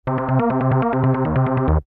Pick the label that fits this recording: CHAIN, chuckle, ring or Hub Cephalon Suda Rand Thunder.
ring